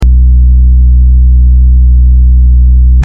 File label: Low hum